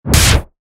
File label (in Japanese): 斧 通常攻撃; モノラル; 武器効果音